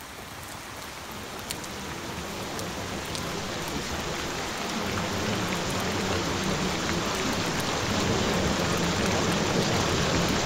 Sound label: 728 Hz, Gentle Rain Sounds; Find Your Soulmate; for True Love